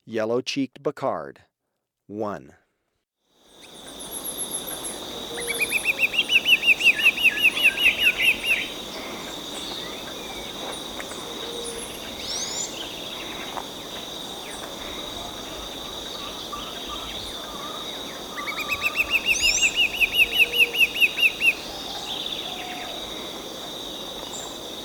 Yellow-Cheeked Becard (Green-backed Becard), Birds of Ecuador; S. America